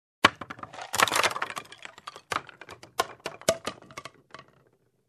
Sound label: Wooden Parts rustling